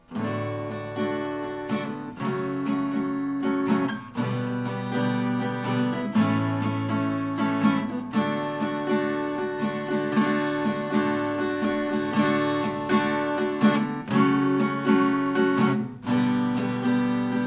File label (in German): Playback Gitarre